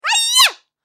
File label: widow good roll; Dice World